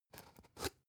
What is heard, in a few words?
spice jar big scratch open slow; industrie wirtschaft; gastronomie